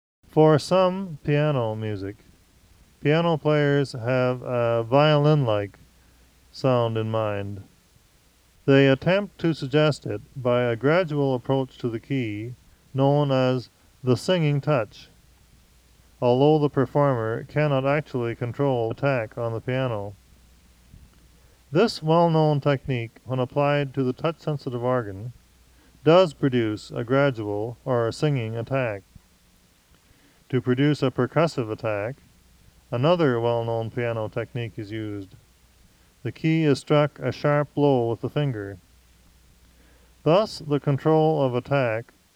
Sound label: Attacks; lecaine; Compositions Demonstrations; Le-Caine Hugh